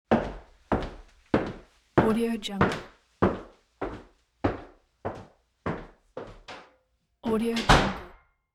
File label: walking into bedroom closing door